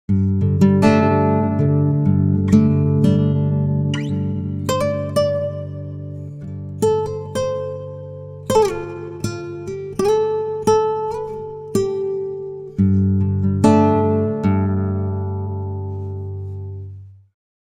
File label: guitar, pitchshift